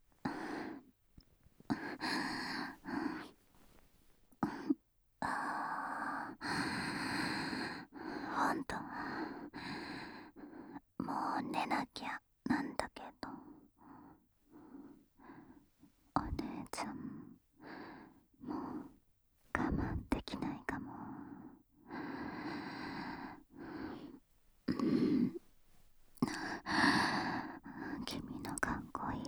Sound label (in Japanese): クールお姉さんとのお布団超密着正常位えっち